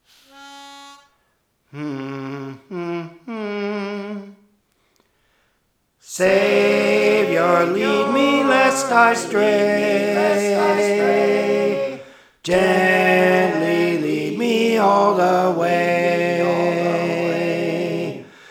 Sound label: Soprano; Praise For The Lord Training